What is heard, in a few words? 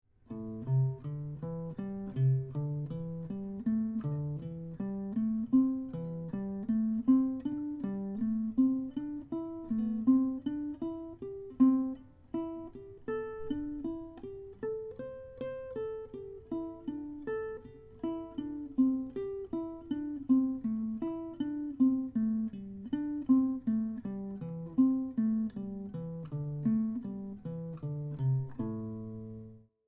Consecutive 5 note pattern, minor pentatonic scales on guitar